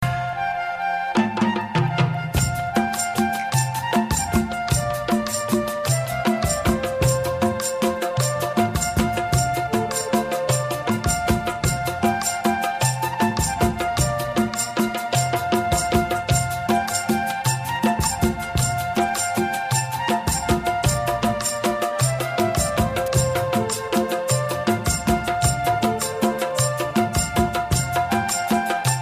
punjabi

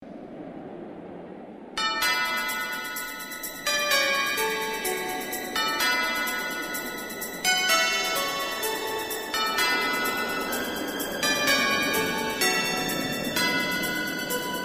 giant bells

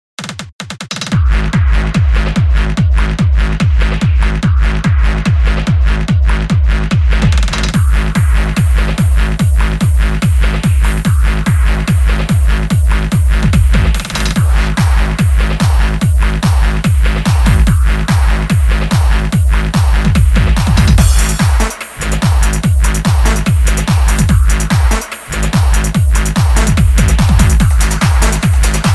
mega beat style

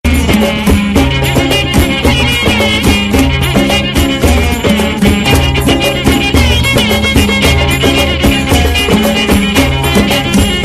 gypsy violin

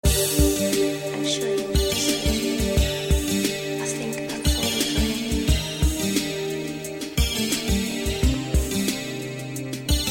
guitar music

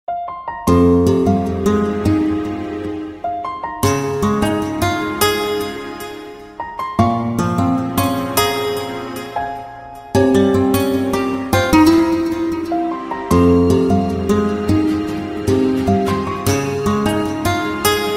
romanian guitar

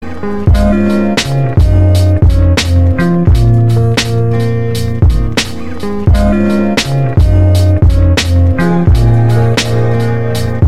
mellow rb beat

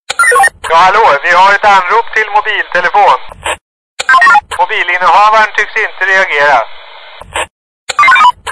swedish police call

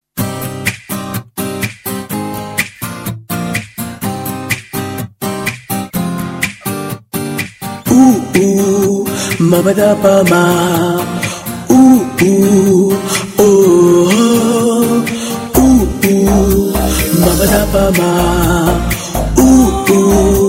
guitar; kandhakottai

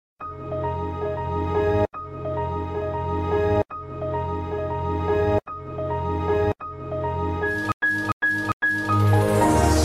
windows tune mix

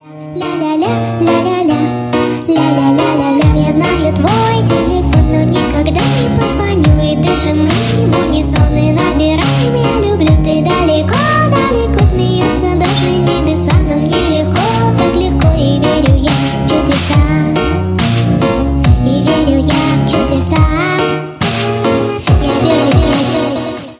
Remix

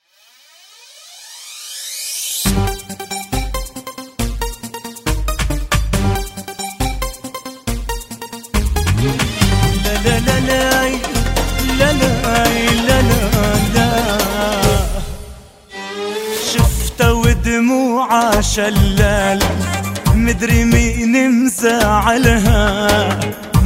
arab arab

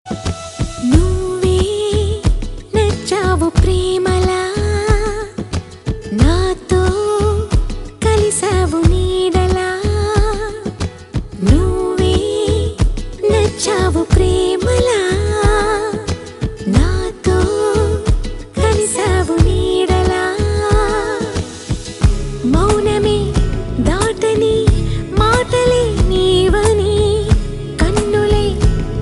telugu ringtone

